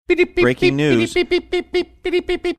making breaking news teletype sounds saying breaking news